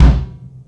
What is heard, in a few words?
Kick, Kick One-Shots; KJ Sawka - Drum n Bass & Beyond; Drum Tool Box